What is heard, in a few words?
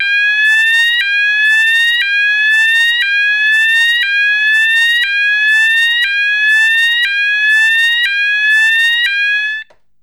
Sounder